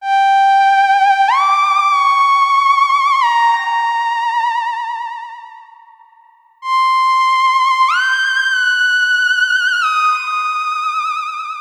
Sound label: Eerie Theremin